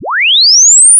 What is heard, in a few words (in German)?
ton; physik; akustik